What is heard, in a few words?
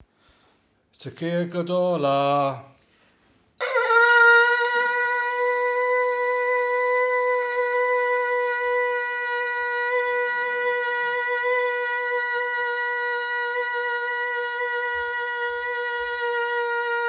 shofar Yom Kippur